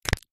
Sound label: human body joint click crack